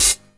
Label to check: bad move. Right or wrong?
Wrong